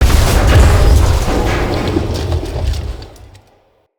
Ruins Machine Loop Destroy; Props

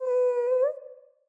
Wolf Cub Trapped Call Close

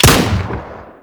Thumper Bot Shotgun Fire; Warframe; Grineer

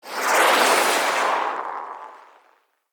Siege Proj Whoosh; Midrath; Warsong Prologue

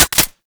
Uzi Mech; Warframe; Tenno; Prime Tenno Uzi; Weapons

